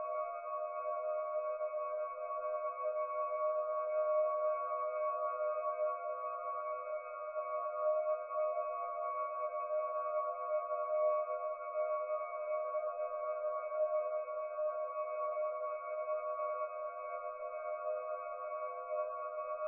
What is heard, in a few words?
Drone light major dissonant chord mysterious